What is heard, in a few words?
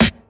hip hop steel snare drum sound a sharp key